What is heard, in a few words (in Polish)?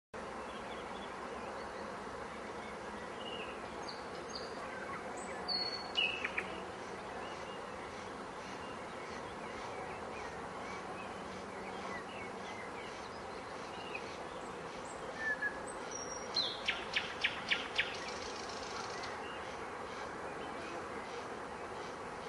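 słowik szary; zakole wawerskie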